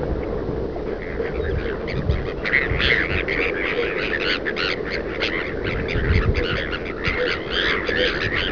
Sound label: bonapartes gull